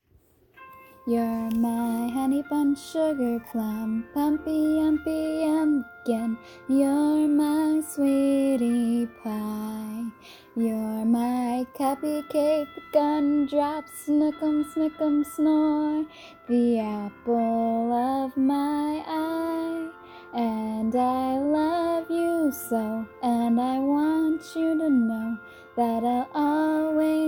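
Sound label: Song, Short, Sweet, female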